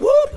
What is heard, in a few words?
whoop; Voice; ooh ahh